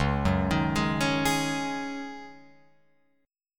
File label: Db-Suspended 2nd Suspended 4th-Db-1,1,3,3,4,3-8-down-Guitar-Open F; arpeggiated; JGuitar